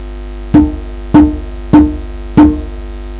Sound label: tom; peyman nasehpour